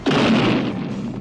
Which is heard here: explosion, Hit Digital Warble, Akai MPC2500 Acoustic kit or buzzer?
explosion